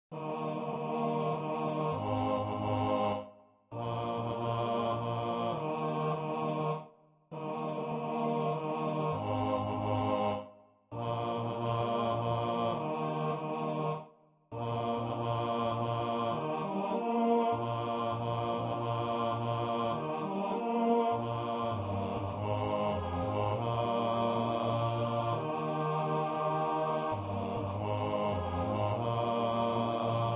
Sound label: Gaudeamus Bass, ttbb